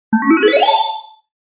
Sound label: correct sound